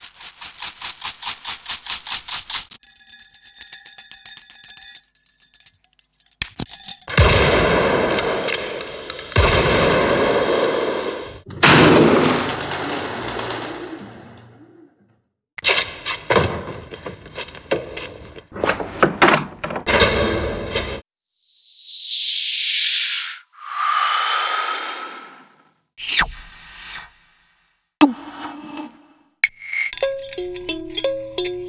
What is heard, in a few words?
Real Recordings